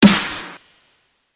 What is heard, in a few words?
snare